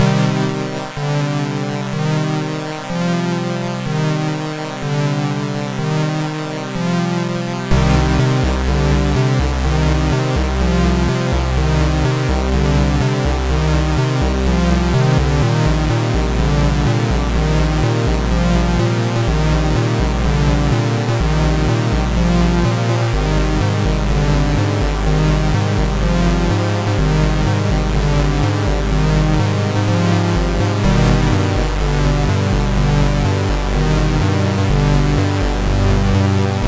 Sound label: synth, OctaMED MED